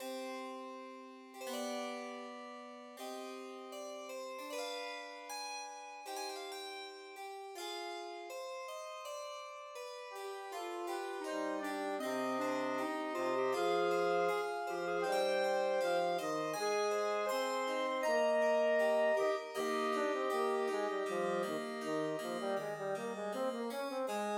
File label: Talking